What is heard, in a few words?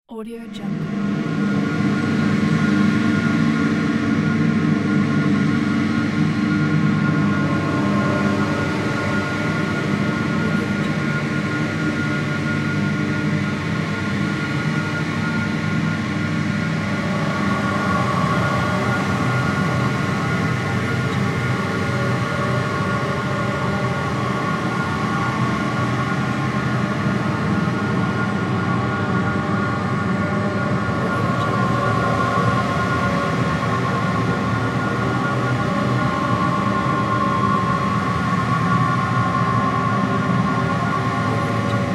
drone